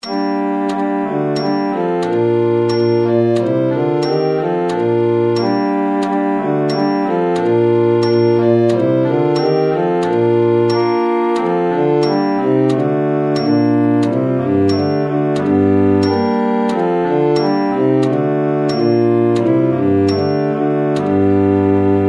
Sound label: Belle Qui Bas; Arbeau